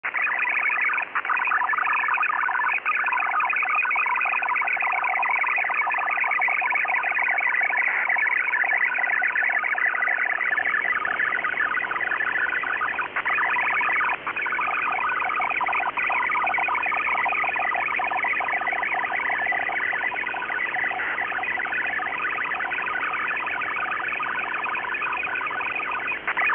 SYS3000 ROBUST 8FSK DATA, EMBEDDED ROBUST 8-FSK MODEM; THALES SYSTÈME 3000